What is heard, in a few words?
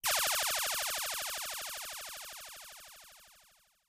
Toy Gun Manga Synth Arp, lasers